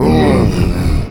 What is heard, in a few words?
mouth full